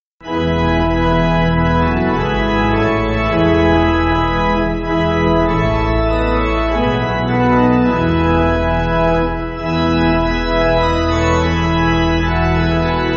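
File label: Pipe Organ, Backing Files for Churches; You Are Powerful; Malan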